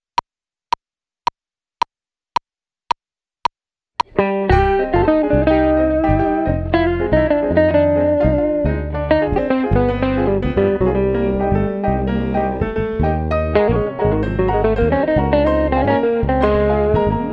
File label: latin songo play along